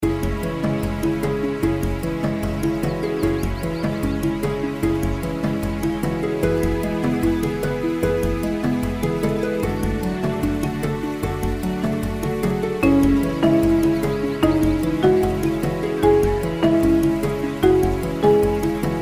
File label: reel spin, primeval forest; Primeval Rainforest